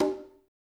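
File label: CONGA, left; Spectrasonics; Liquid Grooves 1 & 2; CONGAS+BGOS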